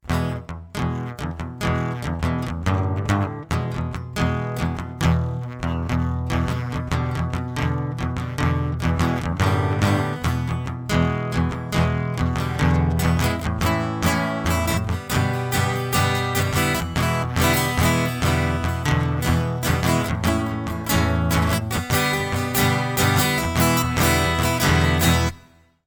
acoustic; MegaEpix

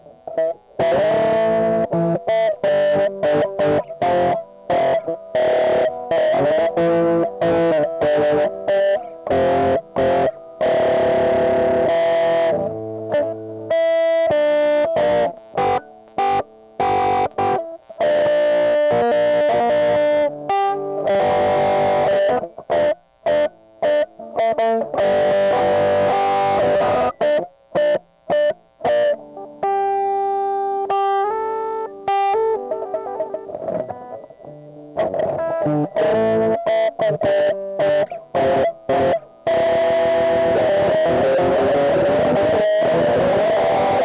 electric; MegaEpix

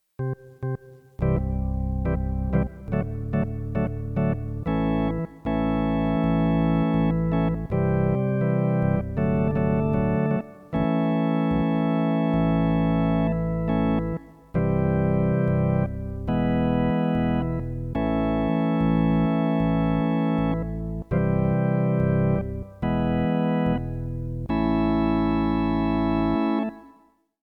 organ prelude

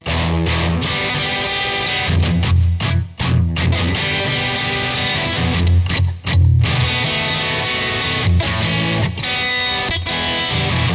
electric

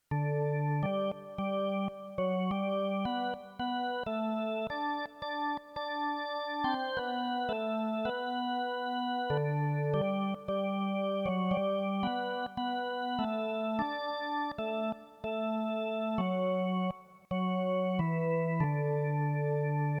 prelude

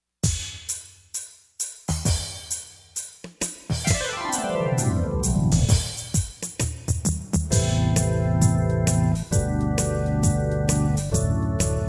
hard rock

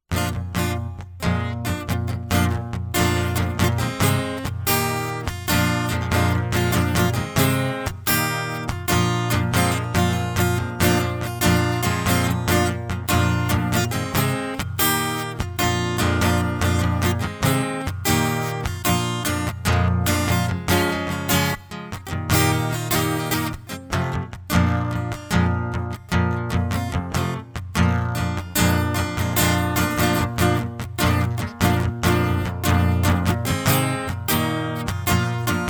acoustic; MegaEpix

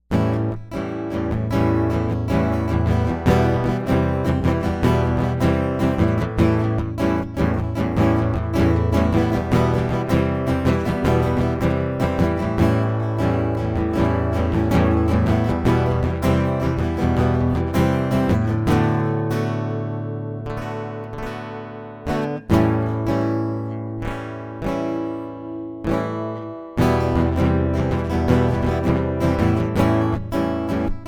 acoustic

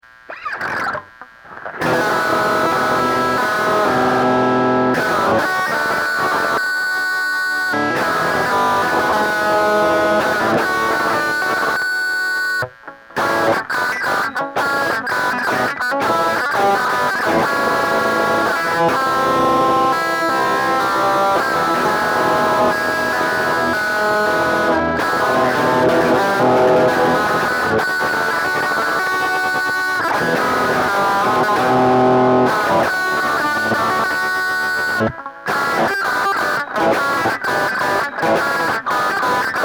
electric

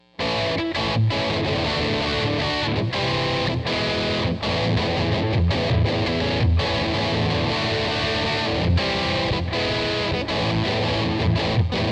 electric guitar prelude

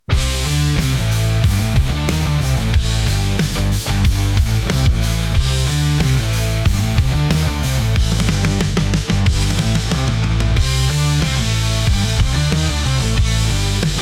Instrumental; MegaEpix

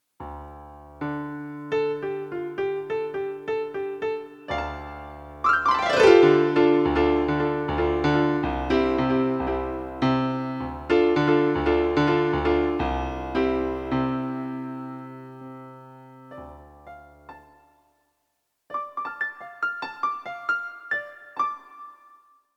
piano; To the Show

